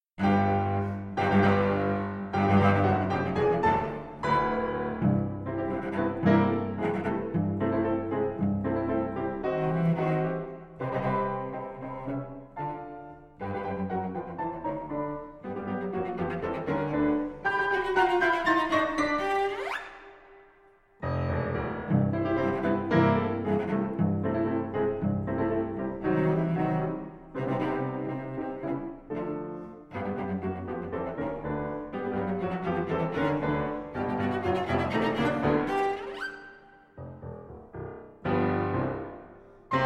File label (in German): Zirkus Tango